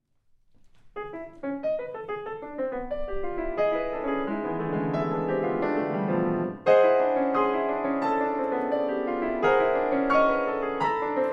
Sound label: Suite for Piano: Postlude